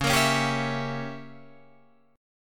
Db Diminished 7th Db Guitar Drop D

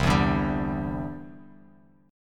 C-Major Add 9th, Bass Standard; strummed

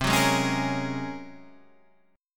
G sharp 11th C

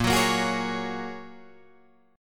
Bb Minor 7th Sharp 5th, Guitar, DADGAD

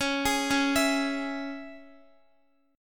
Db Minor, Guitar; arpeggiated

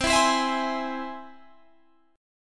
C Augmented down Guitar Open G

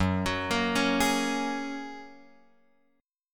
F sharp Major Add 9th; F#add9 Chord {2 X 4 3 2 4} arpeggiated

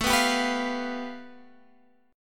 A 7th Suspended 2nd Sharp 5th, Guitar, Open G; 19,16,17,16,18,x

